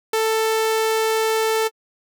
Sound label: sawtooth wave left; Pigments